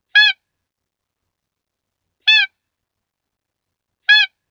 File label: Roe Agitation; Nordik